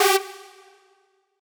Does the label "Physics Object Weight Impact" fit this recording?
No